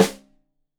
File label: Rim Shot Stereo, drums